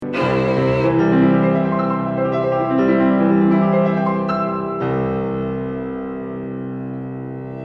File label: ARPEGGIO